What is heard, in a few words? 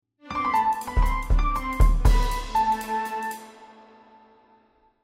Stinger; TS Sounds; falling rooms; Unity